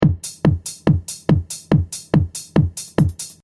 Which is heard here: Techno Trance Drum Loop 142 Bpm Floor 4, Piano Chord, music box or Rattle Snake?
Techno Trance Drum Loop 142 Bpm Floor 4